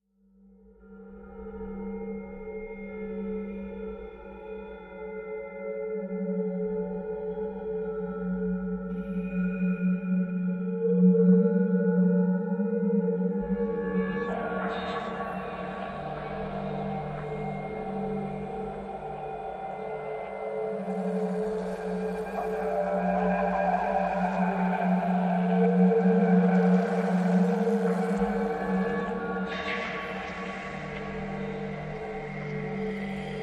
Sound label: Drone, Light